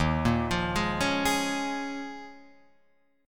Eb 7th Suspended 2nd Suspended 4th Eb down Guitar Open F, Eb7sus2sus4 chord; arpeggiated; JGuitar